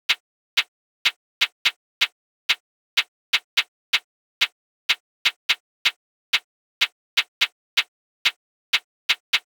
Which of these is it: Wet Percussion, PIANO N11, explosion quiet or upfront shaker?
upfront shaker